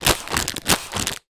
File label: foley packet plastic blow air into; zapsplat